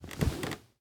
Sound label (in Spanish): SENTARSE BANCO MADERA